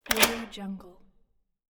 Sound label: door locking